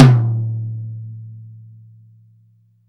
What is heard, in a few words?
TOM, Drum Dry; East Collexion; Drum 1